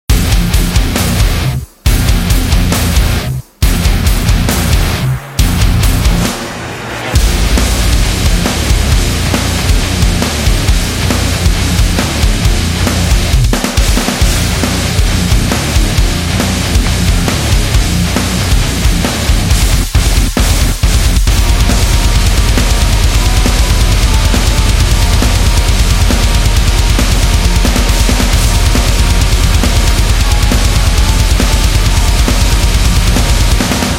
Modern Metal Fight

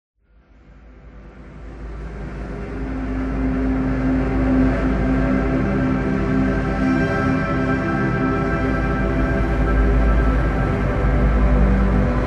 Dark

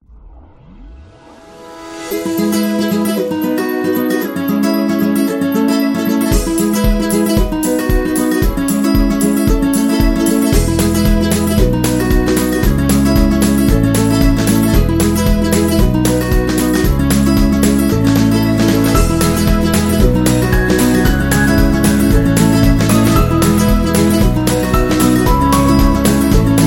Holiday Joy, happy music

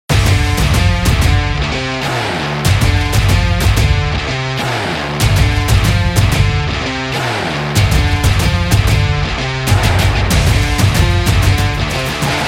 Energetic Sport Indie Rock

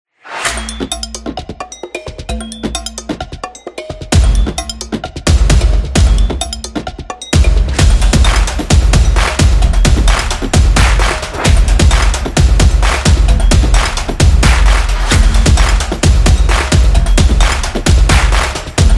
Rhythm

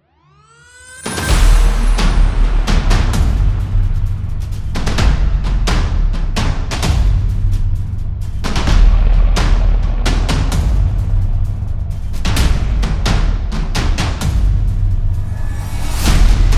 Drum Action Energy; tribe

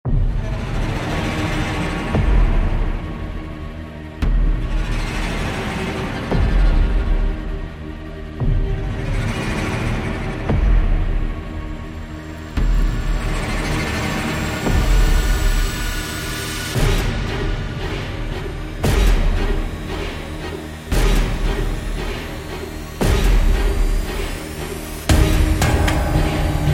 Disturbing

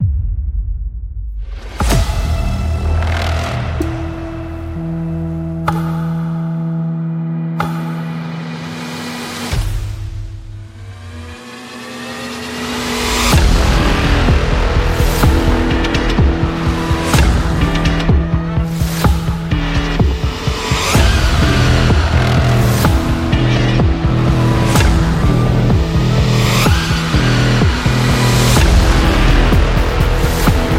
Horror Chase

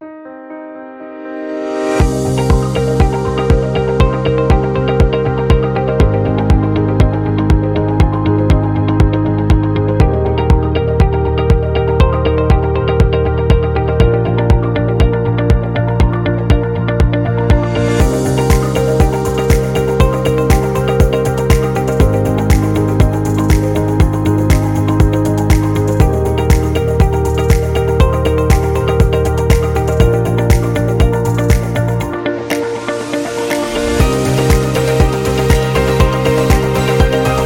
Technology Corporate; corporate music vol 22

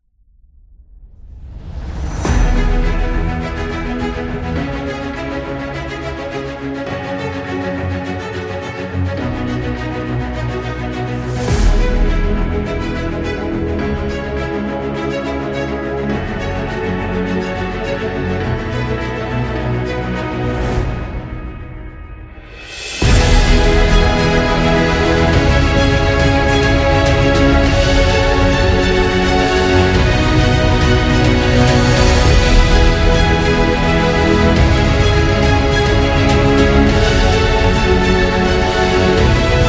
Award Ceremony Opening, epic music; epic music vol 4

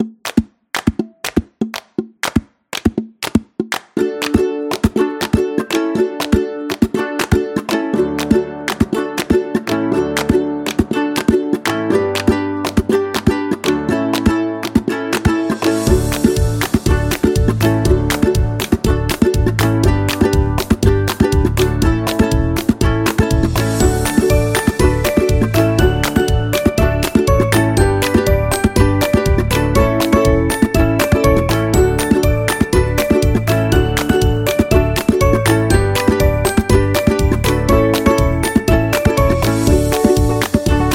Happy Background

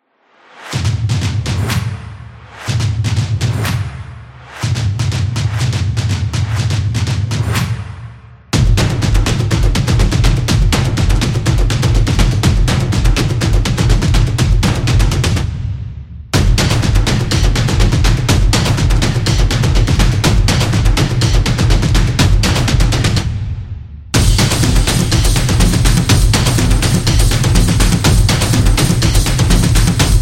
High Beat

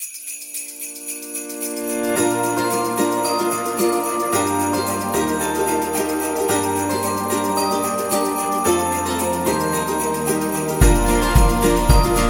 Upbeat Christmas; xmas

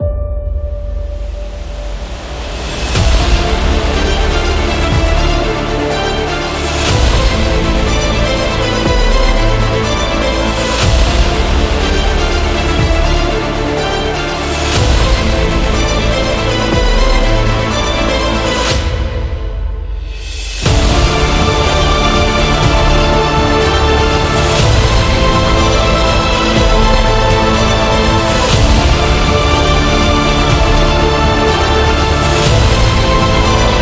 War Cinematic; self motivation

